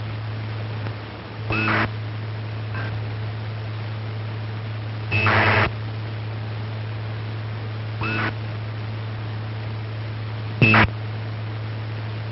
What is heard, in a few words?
acars